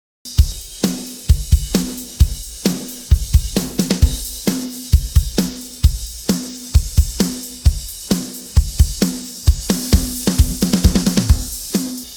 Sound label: pattern, drums